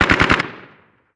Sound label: gun ak47 dist